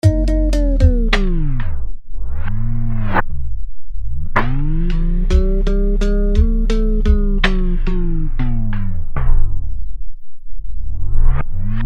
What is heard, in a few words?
TimeShaper 2 Reverse